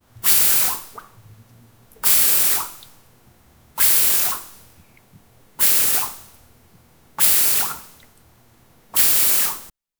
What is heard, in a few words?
light water spray squirts, jet